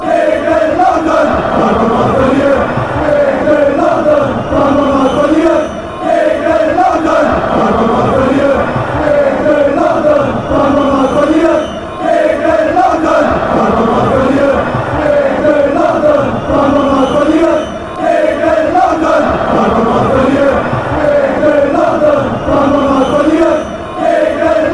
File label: fanchants; against lautern